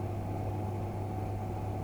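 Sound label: MACHINE Oven Fan Assisted; loop mono; MACHINES & MECHANICS; Universal Sound FX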